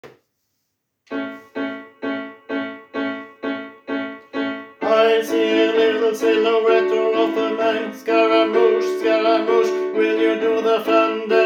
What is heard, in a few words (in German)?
Scaramouche Bass